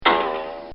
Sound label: Comical Sound Series Boing Spring; Funny Sounds; Bobby Cole